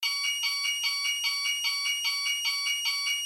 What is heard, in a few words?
panic; polaris